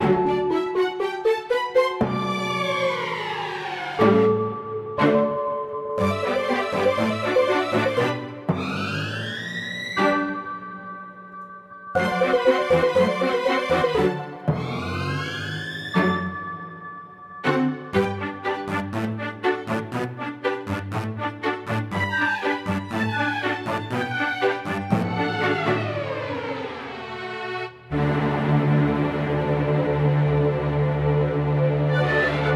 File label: Slippery Ambient